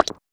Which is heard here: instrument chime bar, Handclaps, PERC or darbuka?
PERC